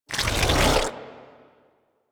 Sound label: Roar Cast; Warframe; Warframes; Excalibur Umbra